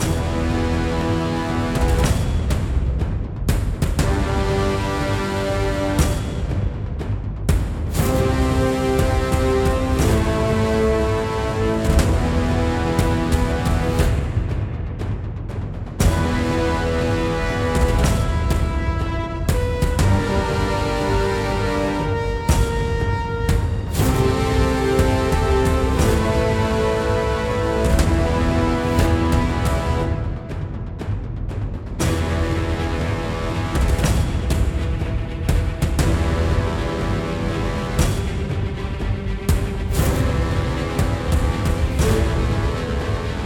Combat Boss Level 2

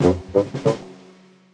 cannon wiimote ready

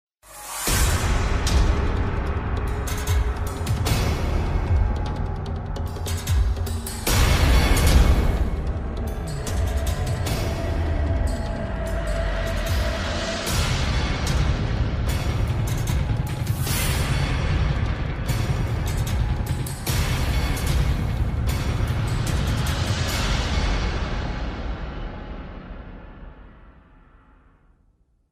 Suspense Theme

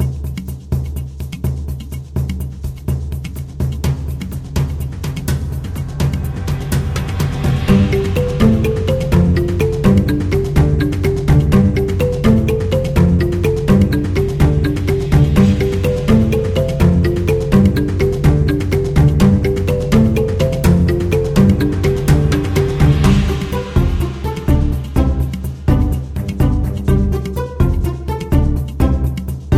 Sting

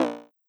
Button Failed

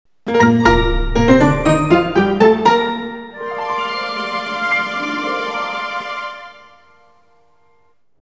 Story Jingle; Love Live SIF2 Miracle Live; android ios

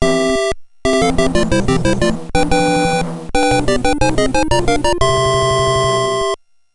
Castle Clear Fanfare; Super Mario Maker for Nintendo 3DS

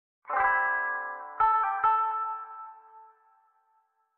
Rhythm Village Intro Stingers; Mountain Music

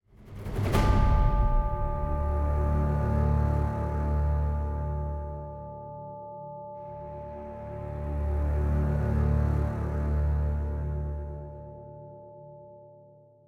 Home Sad Calm Before The Storm Stop 40bpm 2bar; project zomboid

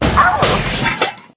Robot Break; Clickergame

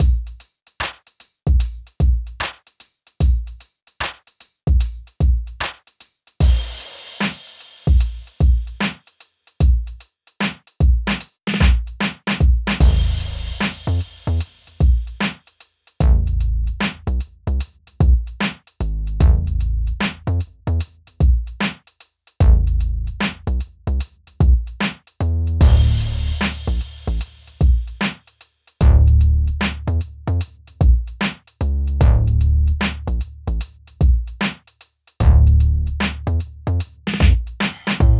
Hip-Hop (No Cheering); wii fit u

